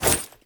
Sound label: Generic Foley; Warframe; Uriel